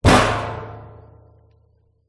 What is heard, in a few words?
impact metal oil drum empty